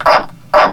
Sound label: grunty, headcrab poison; hl2